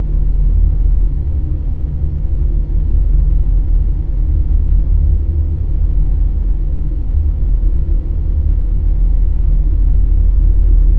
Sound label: amb loop monitorroom on